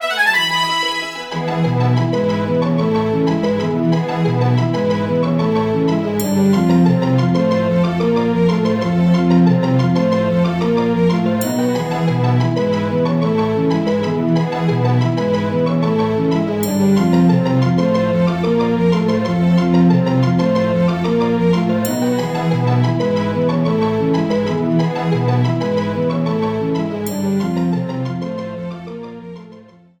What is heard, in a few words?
Victory!; gemstone symphony music from pok mon diamond and pearl 2023; Wild Pokémon